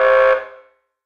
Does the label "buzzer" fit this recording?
Yes